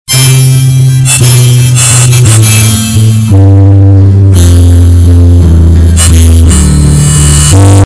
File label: piano